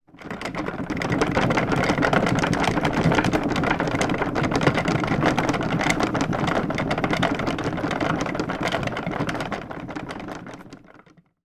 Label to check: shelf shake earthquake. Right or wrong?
Right